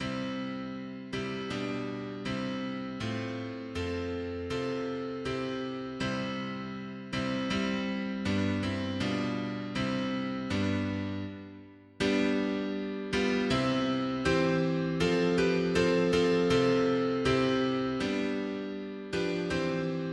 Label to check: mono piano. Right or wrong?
Right